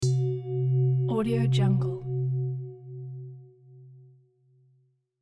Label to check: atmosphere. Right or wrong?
Wrong